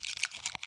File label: spitter walk, creatures